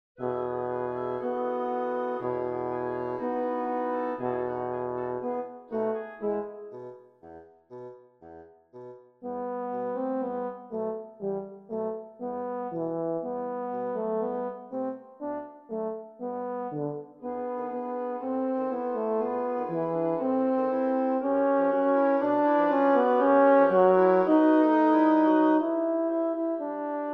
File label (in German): Horn